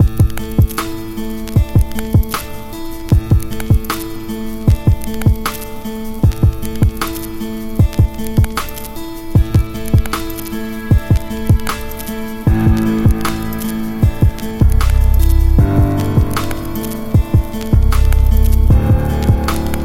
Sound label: GuitarMusic; Ringtones; House, M.D.